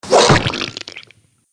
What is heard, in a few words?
Sword Slash Into Flesh; Sword Sound Effects; combat